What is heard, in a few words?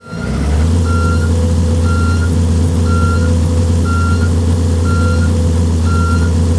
rev; tdmcars